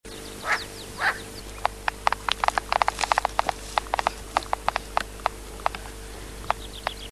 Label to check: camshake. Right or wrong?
Wrong